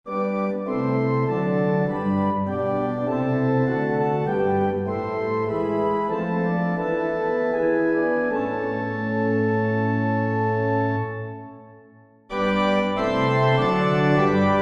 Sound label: Hymn Bristol Organ; 4 Verses; Hark The Glad Sound; Hymnal 1982